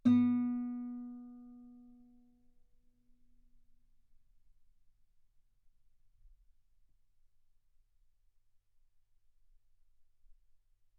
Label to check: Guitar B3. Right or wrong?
Right